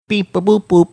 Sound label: beep baboop boop